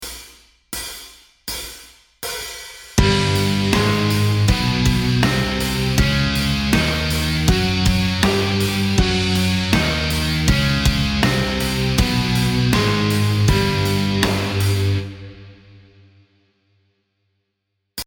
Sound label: Exotic Modes G Persian Relative Ab Major; metal music theory